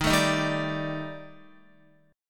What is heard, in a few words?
Eb Major 7th Flat 5th Eb Guitar 4E 4A 5D 5G 5C 6F; EbM7b5; strummed; JGuitar